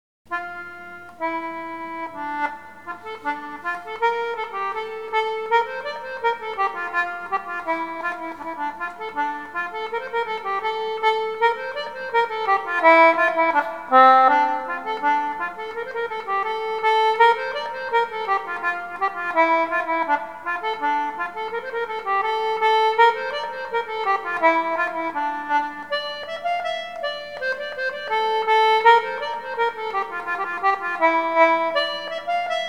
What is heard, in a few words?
french scottische, concertina